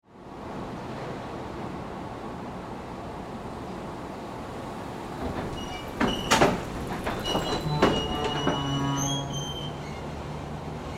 Factory, Industry, Factory, Dynamic, Vehicle, Test, Assembly, Plant; Dvt, General, Motors; Factory Sound Effects